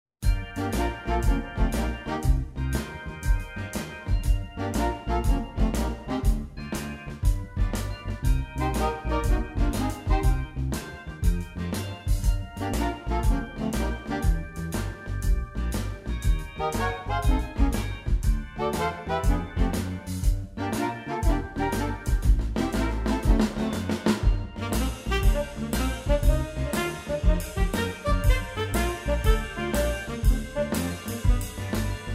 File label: Blues